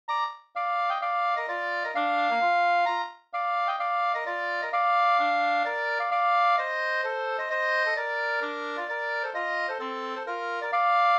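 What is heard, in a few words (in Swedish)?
polska, tvåstämmig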